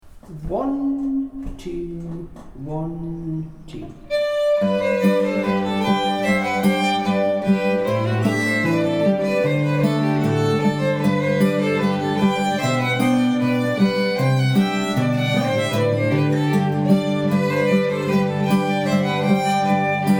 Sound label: Because He Was a Bonny Lad Fiddle Tune Guitar Harmony